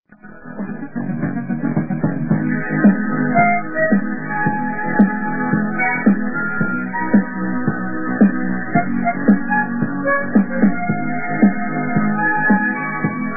flauta

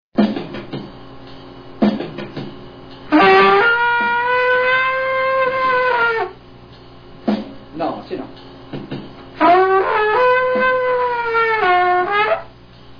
sopro

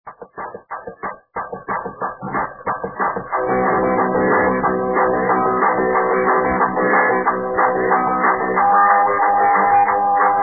rapidinha

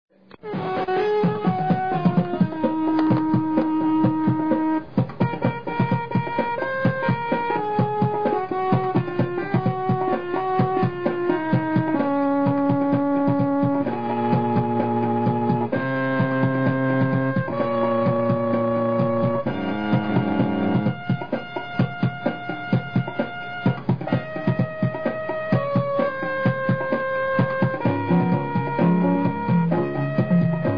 orgao ritmo

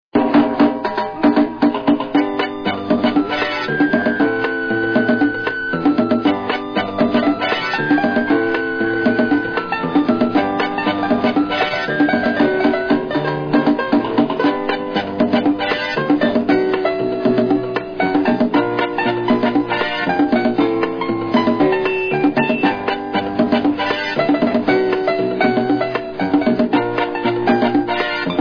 ritmada

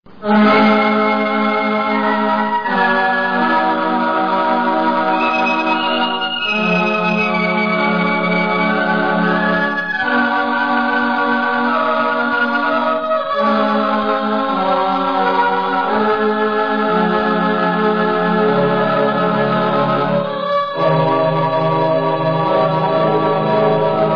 orgao

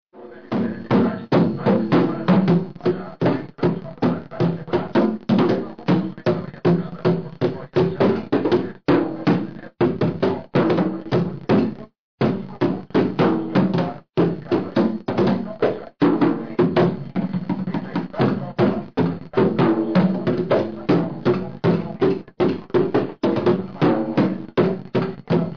percussao

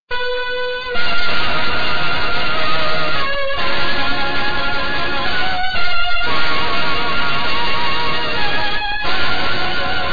orgao

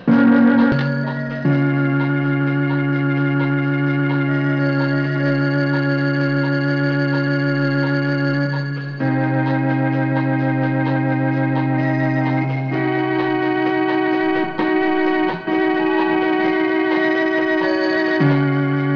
orgao

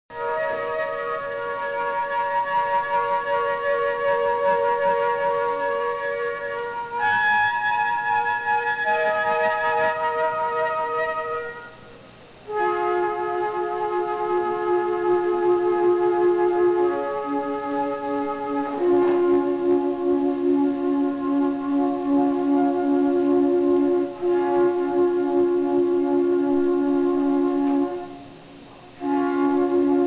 trompas

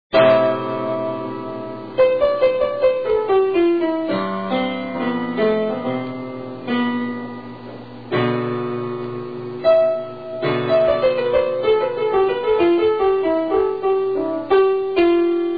calma no piano

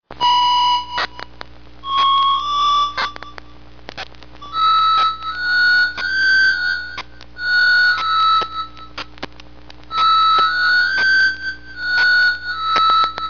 orgao

